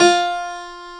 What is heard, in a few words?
PF, GRAND PIANO; AKAI S6000 CD-ROM - Volume 1